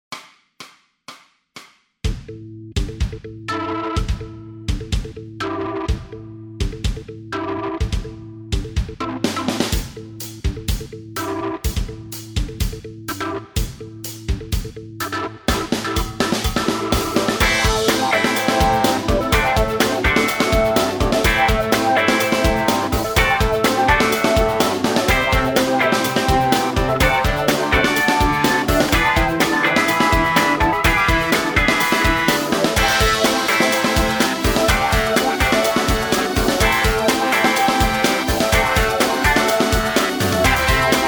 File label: bass blues funk, backing tracks